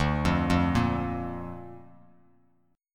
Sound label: D Minor Sharp 5th D down Bass Standard; Dm#5 arpeggiated; JGuitar